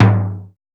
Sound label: TOM; E-Lab; X Static Goldmine 1